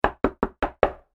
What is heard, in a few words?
Foley Door Knock Solid Door Fast; Objects